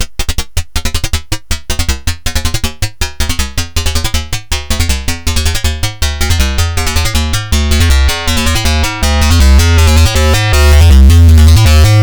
BBD Karplus Resonance

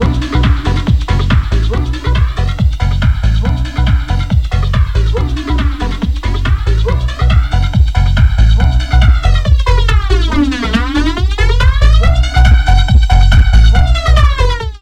BBD 1024 Flange LFO Feedback

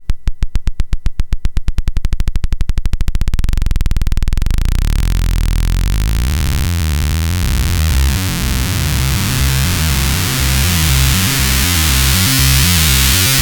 A106 hires clip both

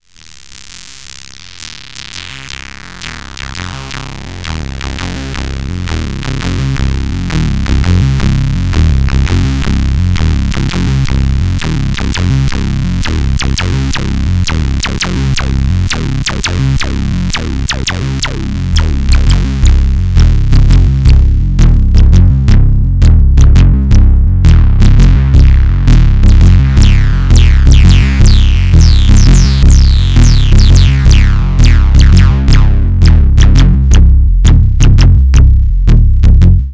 HP to LP fade

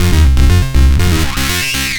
HP hires clip

